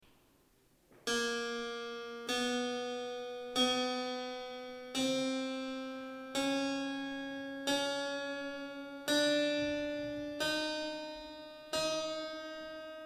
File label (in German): cembalo